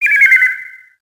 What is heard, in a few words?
plains bird